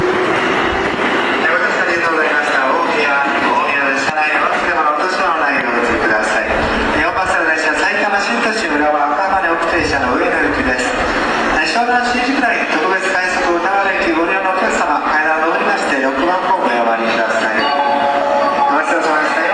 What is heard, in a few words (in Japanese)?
Verde Rayo, 浦和・上野方面; 宇都宮線